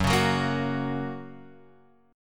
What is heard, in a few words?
F sharp Major, Guitar, Open F; strummed; JGuitar